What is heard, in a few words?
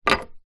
leisure toy fidget spinner metal pick up from table; zapsplat